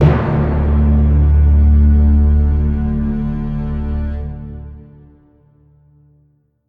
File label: combat end lost sting; Armello The Bandit Clan